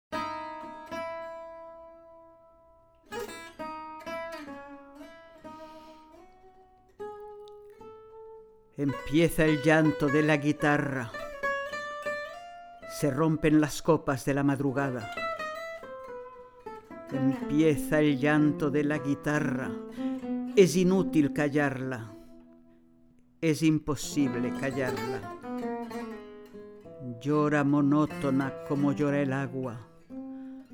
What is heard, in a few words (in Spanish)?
La Guitarra